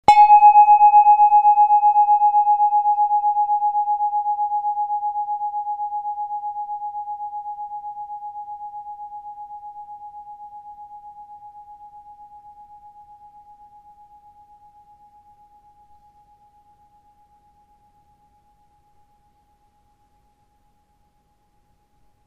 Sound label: cosmic tube